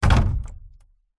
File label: industrial 10 litre plastic drum water heavy set down